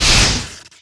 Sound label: Electric Ball